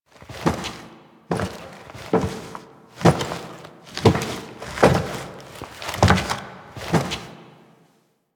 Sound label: Foley Steps; Cinematics; Stalker; Warframe; Orbiter Cradle